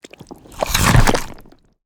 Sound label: creature texture mud; Bluezone Corporation; Organic